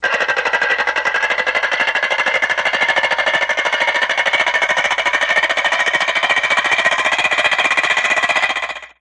Ratchet Wind Up, ratchets

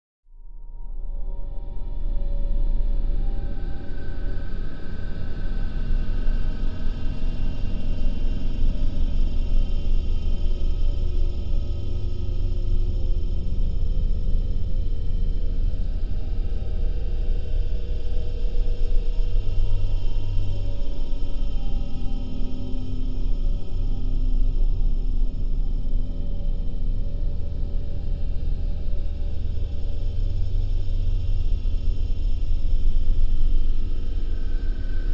Bed Impending Elect D

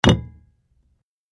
Beer Mug Thump Clinks

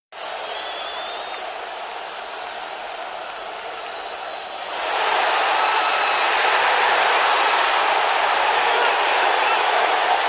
Football Crowd Huge; sports arena football

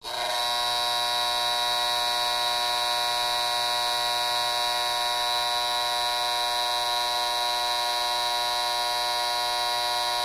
Alarm Oven Timer Old

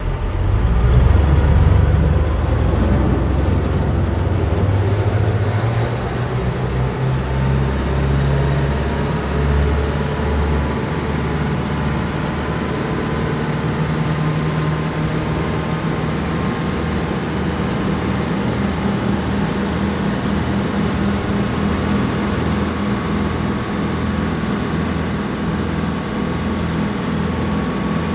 Boat Engine Moving

